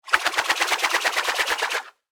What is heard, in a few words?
Warfare Grapple Gun Rope Spool Fast; Miscellaneous Combat